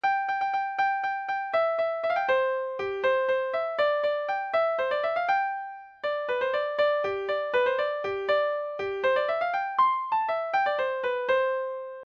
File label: tune, mandolin; trumpetts